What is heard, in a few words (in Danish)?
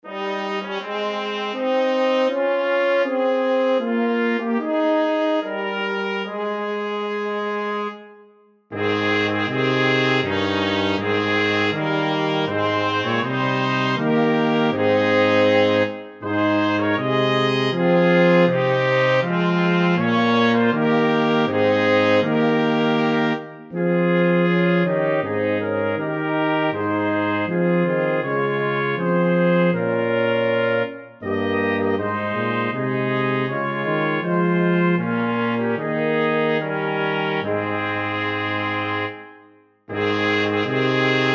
Messingkvartet 3 Vers; Kloge folk de stabler